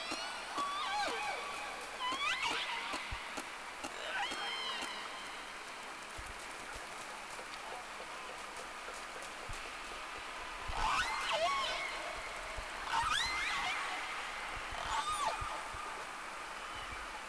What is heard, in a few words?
Whales; SeaSnake